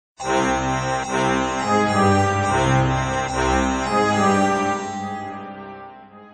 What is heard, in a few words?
sms tone; ringtones